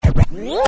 scratch, vinyl

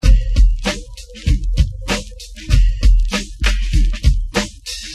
BRK BEAT, beats

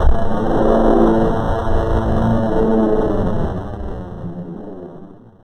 NOISE

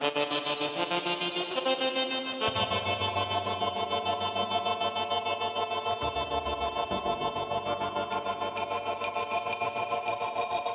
tenor

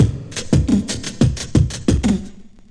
Fatboyz, Drums

